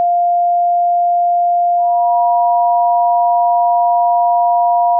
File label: 700Hz and 960Hz